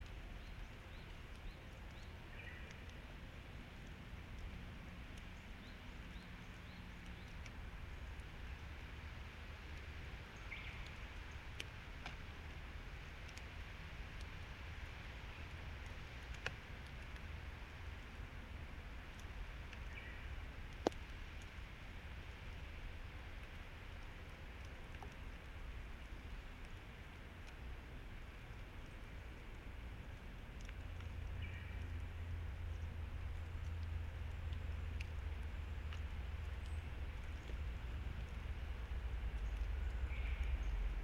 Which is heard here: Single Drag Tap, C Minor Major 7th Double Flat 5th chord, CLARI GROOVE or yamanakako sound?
yamanakako sound